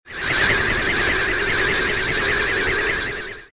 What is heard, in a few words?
spider roar; Garoga